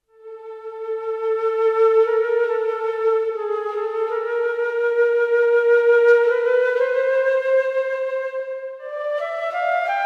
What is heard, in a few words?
Flute